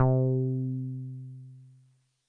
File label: Roland Juno-106, synths; OP-Z